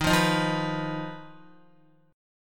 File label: D sharp Suspended 2nd Sharp 5th, Guitar; strummed; chord; x,6,5,8,5,7; JGuitar